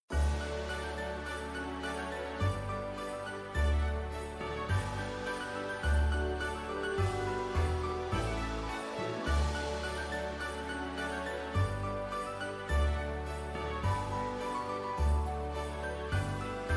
BGM Normal; slots; Christmas Blast

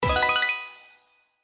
Symbol High Pay Win, SLOTS; Realm Saga

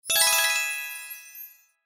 SFX Symbol Win Wild; slots; Steam Miners

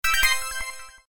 Bonus Win; slots; Wildlife Adventure

